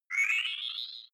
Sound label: game start; signale